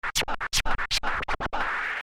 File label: scratch